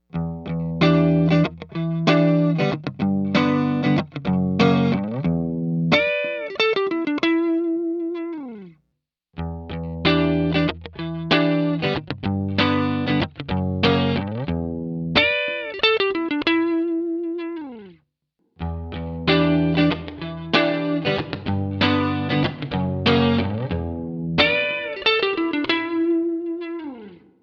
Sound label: clean